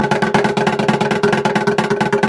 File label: drum roll